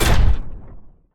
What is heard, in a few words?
mech Step, movement; Mindustry